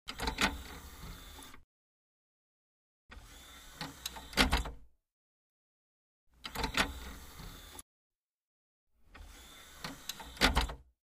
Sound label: Opening, closing the CD-DVD; computer